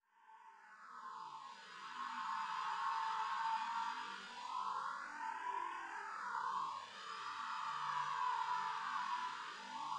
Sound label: Idle Portal; ludumdare32